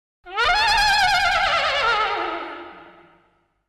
Rzhanie na trube

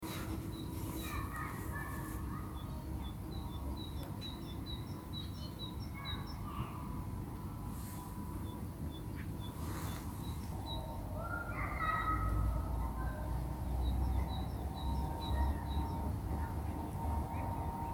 park utro daleko dvoe detej

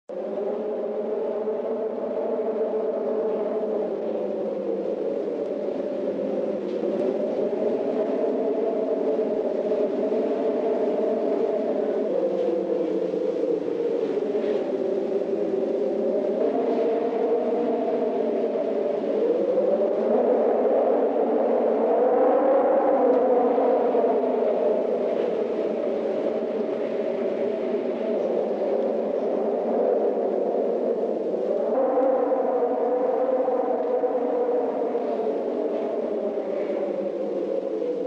voj vetra; Страшные звуки для монтажа

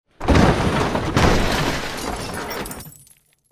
mashina razvalivaetsja pri padenii

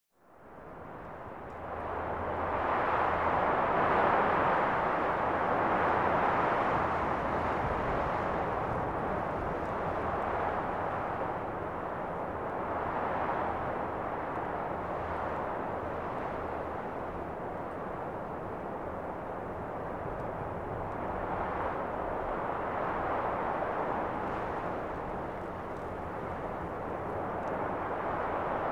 poryvami veter v verhushkah derevev; Звук ветра в лесу; Звук ветра в листве

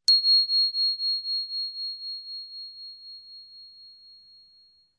zvuk kolokolchika zvonuij i chistyj

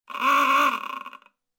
signal; Звуки подводной лодки

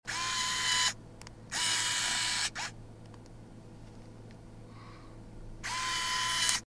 eshhe serija zumov kamery

